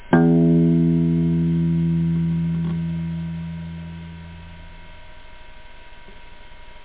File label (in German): E Saite, Guitar; Guitar1.01